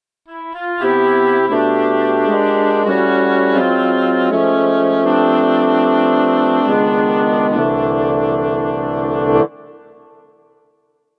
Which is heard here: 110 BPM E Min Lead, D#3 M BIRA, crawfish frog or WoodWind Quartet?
WoodWind Quartet